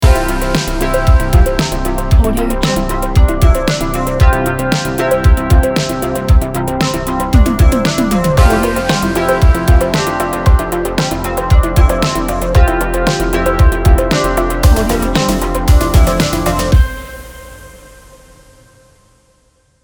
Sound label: synthwave intro ident